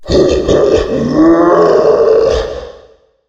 megaspud boss spawn

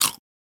eat chips, potato; minecraft

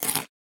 eat chips, potato

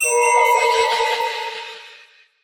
allay idle without item; minecraft; mob

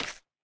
resin hit; block; minecraft

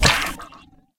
land hit; toxifin

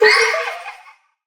allay item given; minecraft; mob

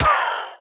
death, parrot; minecraft; mob

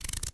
peel, potato

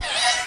land idle; toxifin

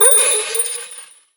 idle with item, allay; mob; minecraft

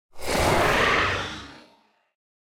plague whale elder idle

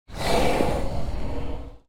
plague whale elder idle; minecraft

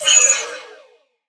allay item taken; mob; minecraft

